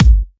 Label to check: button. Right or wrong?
Wrong